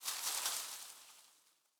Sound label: leaf rustle crunchy hard